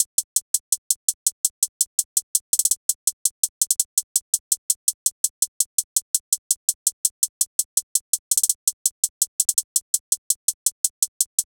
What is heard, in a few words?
Drum Loop 166 BPM Hihat, Trap; Loop Stems; Chamber